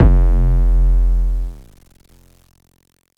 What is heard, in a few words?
Dank 808